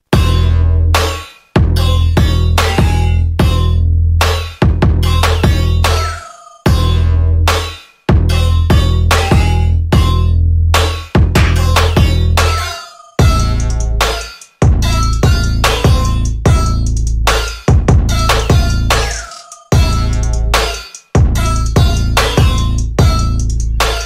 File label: instrumental